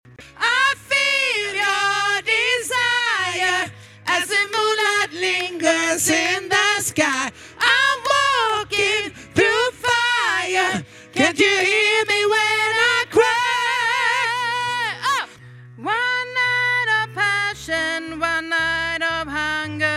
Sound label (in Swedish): alt, stammor